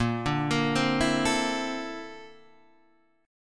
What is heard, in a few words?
A sharp Major Sharp 11th